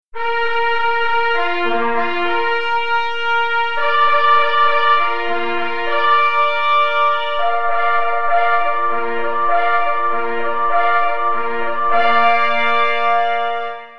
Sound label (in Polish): na 3 plesy; K. Kryk; Chwala odznaczonym